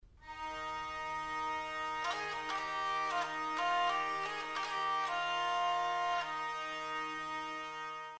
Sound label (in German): Drehleier Klang